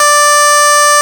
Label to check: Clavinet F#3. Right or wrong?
Right